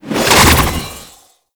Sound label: Archwing Prime Sword Short Whoosh; Warframe